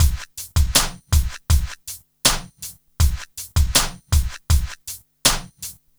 Smooth, R&B Drums

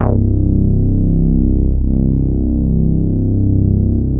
MOOG BASS; AKAI S6000 CD-ROM - Volume 1

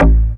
bass techno

